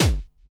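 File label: snare drum bass drum, Dance Mega Drums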